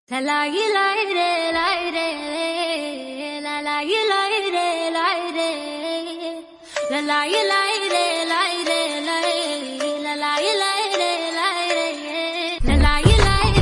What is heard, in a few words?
South Indian Song Ringtone; Soorarai Pottru